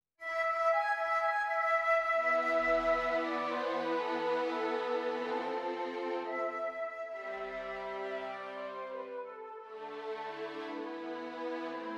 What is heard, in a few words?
Song of a Flute; score